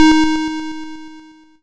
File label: YM Synth D#; ChipShop; CHIPSHOP DELUXE; CHIPSHOP HITS; SYNTHS